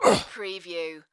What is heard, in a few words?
Pain Umph, Male Painful Grunt, Hurt